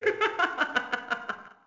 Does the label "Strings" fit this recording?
No